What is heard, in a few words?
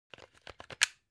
object household plug unplug clatter